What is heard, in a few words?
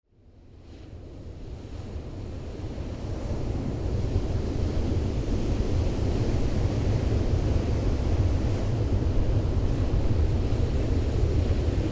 point waves; A point break can make a nice spot for recording large surf,Oregon coast.; Microphone: Rode NT1-A(x; Recorder: Marantz PMD670